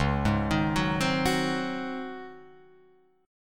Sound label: C sharp Major 7th Suspended 2nd Suspended 4th Guitar Open F; C#M7sus2sus4 arpeggiated; 1,3,3,1,3,1; JGuitar